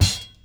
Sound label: Hit, Kicks